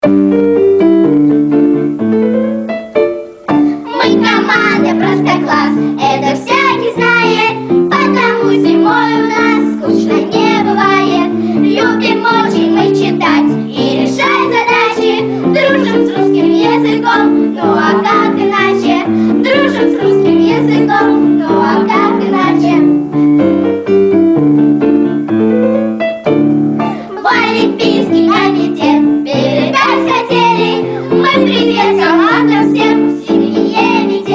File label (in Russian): Песня